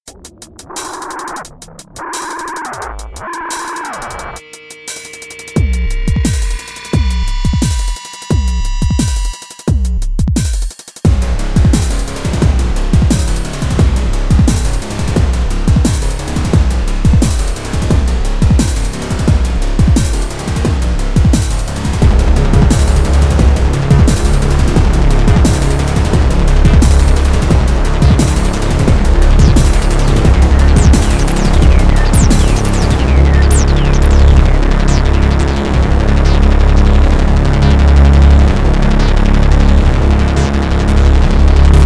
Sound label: Industrial - Tank; Project Warlock